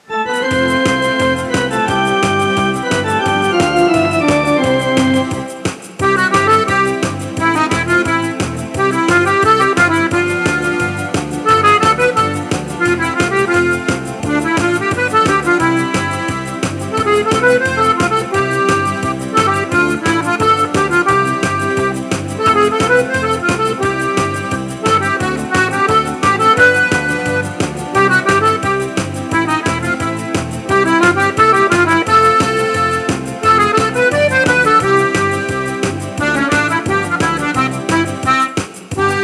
polka

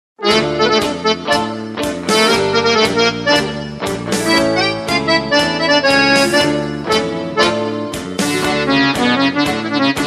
Tango

